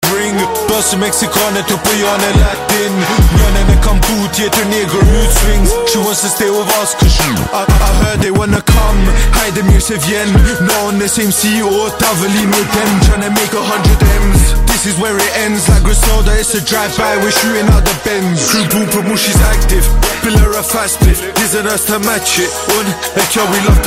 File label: ring ring